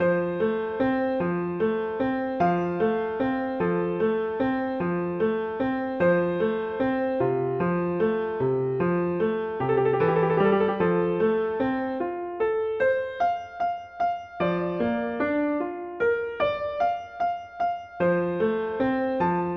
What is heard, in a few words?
Sonatina, Andante; Clementi; Sonatinas